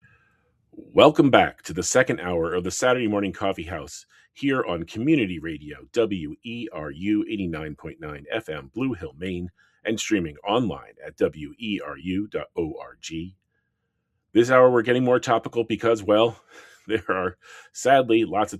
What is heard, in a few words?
Break